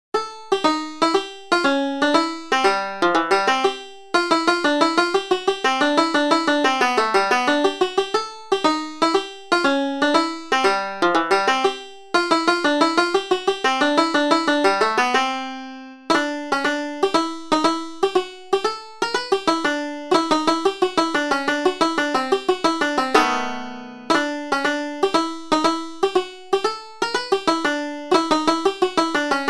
Cowes Schottische

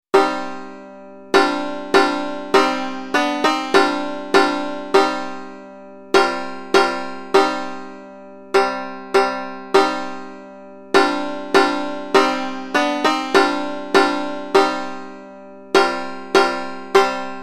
Jumbo Rag

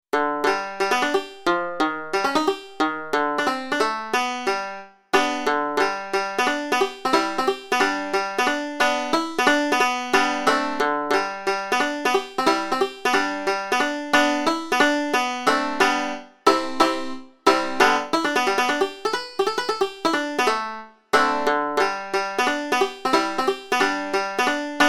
Hoe Down

